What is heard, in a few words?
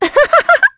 Vox Laugh; kingsraid; en